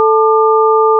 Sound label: test 440 880